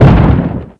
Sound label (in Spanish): Golpe Roca; Grounch